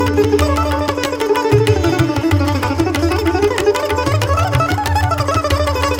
Yaman, hindustani raag

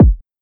kick

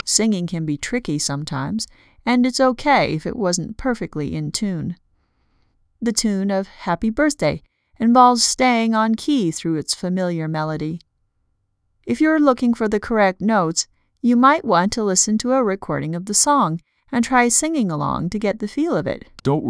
sing

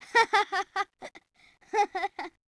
laugh long; GRU MIEL

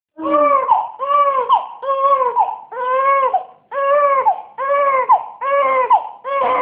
hungry, Donate a cry

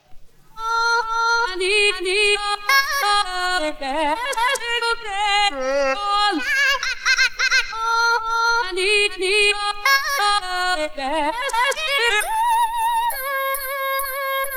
vocals

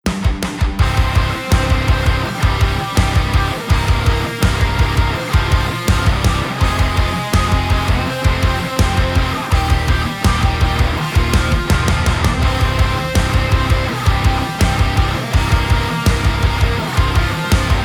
drums; MusicGen

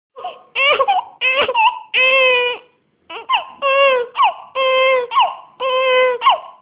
hungry, Donate a cry

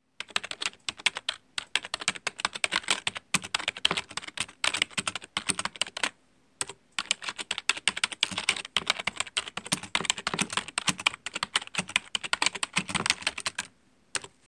Crack Typing; Type-Byte; type-sounds

